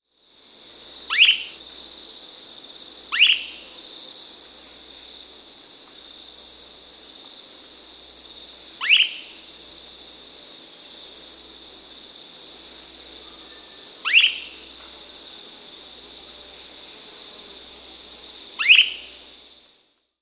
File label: Ash-throated Gnateater, Conopophaga, Nature's Songs, S. America